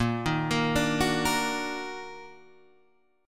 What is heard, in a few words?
D sharp Minor Major 7th A sharp